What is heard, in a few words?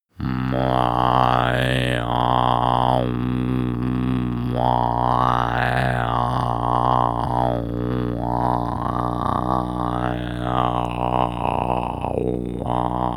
male throat singing bass